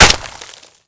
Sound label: Projectile Sounds; Red Orchestra Ostfront 41-45